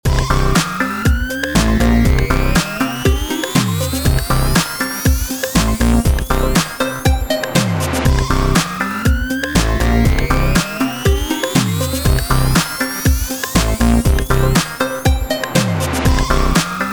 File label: loop funky